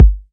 raw kick single hit g sharp key; tuned drums

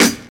Sizzly Snare Sample A#

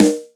damped snare drum d sharp key; snare drum samples

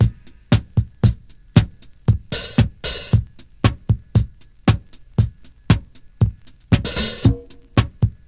116 bpm drum loop sample a sharp key

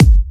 fresh punchy kick drum single shot g key

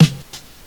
damped snare single hit d sharp key; snare drum samples

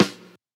wet snare one shot f key; snare drum samples

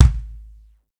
bumpin bass drum sound g sharp key

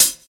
crisp hihat sound A key

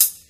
studio foot hi hat sound f sharp key; hat samples

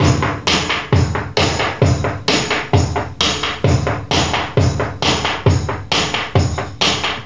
132 bpm breakbeat sample b key; drum loops

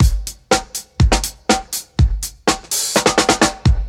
123 bpm breakbeat sample A key; drum loop

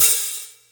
short reverb open hat one shot c sharp key

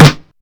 deep steel snare drum sample d sharp key

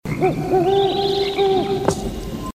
owl hoots in the forest

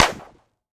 sparkly snare drum sound D key; snare drum samples

